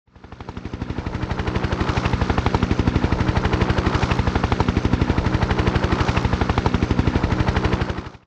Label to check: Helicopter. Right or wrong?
Right